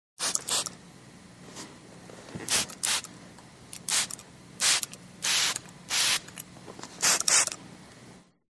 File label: Atomizer; SOUND EFFECTS1